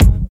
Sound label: bright kick single shot G sharp key, kick single hit tuned to the G# note